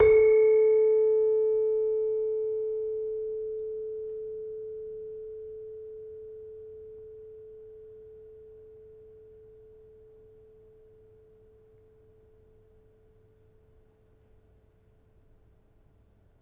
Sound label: Demung, gamelan